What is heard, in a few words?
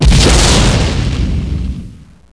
explosion